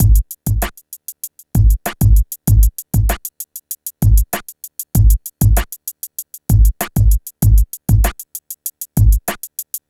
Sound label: PHATT BEA, Hip Hop; E-MU Formula 4000 Series Vol. 1; Hip Hop Nation; PhattBeats95-99